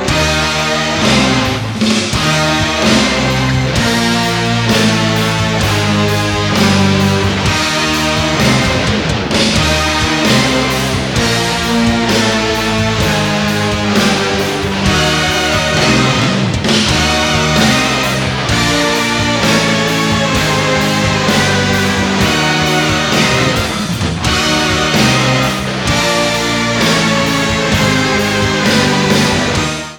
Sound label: HorrorRock